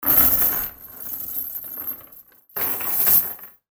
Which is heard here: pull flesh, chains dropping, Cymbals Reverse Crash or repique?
chains dropping